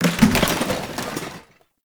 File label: Janitor Cart Impact; Ambience; Gameplay; Warframe; Nineteen Ninety Nine